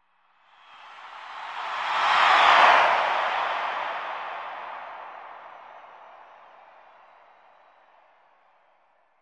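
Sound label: Arena Crowd Cheer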